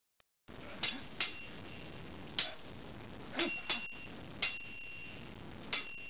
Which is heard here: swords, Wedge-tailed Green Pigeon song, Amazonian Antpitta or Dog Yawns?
swords